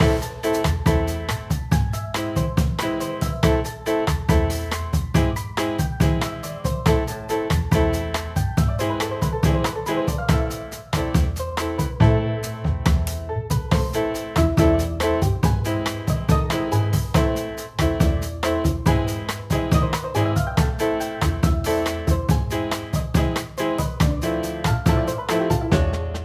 BGM Menu; Boutique Boulevard

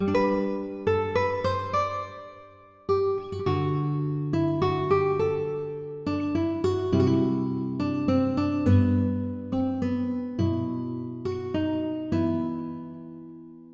tavern; Regions of Ruin

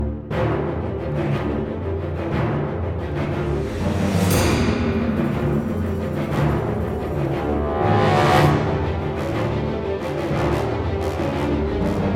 Music Battle; Dungeon Punks; ps vita ps4 windows xbox one; Music Battle Of Dkhorah

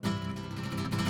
Music Main Amorce 108bpm; Act Hispania

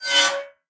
chariot Creak; Puddle; puddle plus ios gamerip 2018

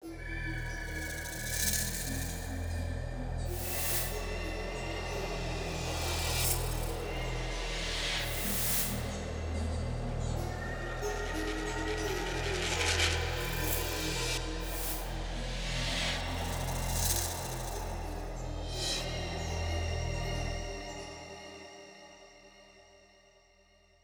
Ambience 140bpm; Mrs Chili; 48beats

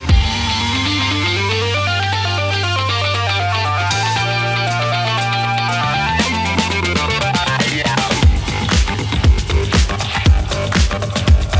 Intro Music; bigfest; ps vita

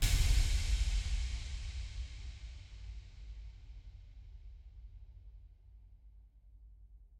blocks Vanish; Desert SFX

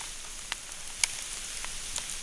Aspire Cripsy; Puddle Plus

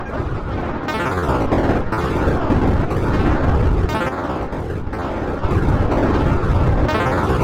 Ricochet

